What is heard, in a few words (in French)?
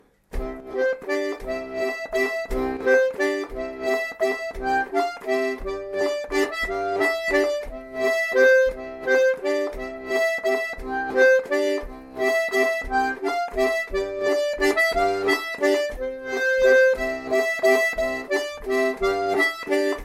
valse